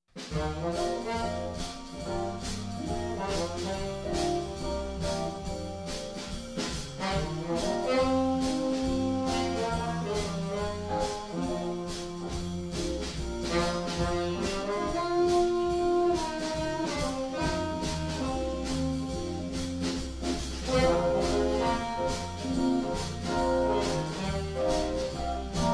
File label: Swing Jazz Combo; Premier